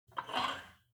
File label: sport gym weight rack pull out